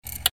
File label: electrical switch